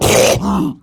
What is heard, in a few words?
Pain; Battle Barks; Warframe; Grineer Ghoul Devourer